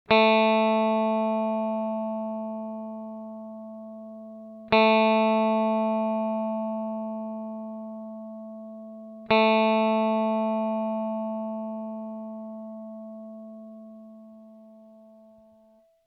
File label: open d high a